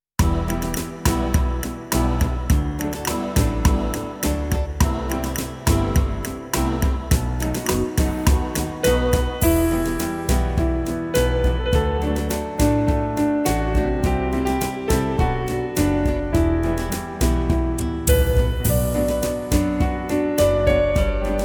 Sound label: rumba